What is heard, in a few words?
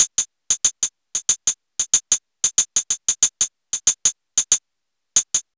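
MPC4 hi-hat; USB Soundscan vol.02; Underground Hip Hop; AKAI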